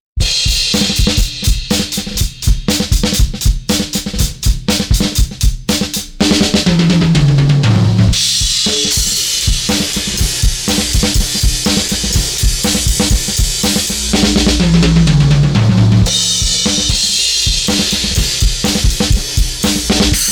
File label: Lindell PEX-500 HIGH BOOST